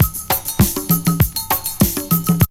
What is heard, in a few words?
break; Zero G - Man Machine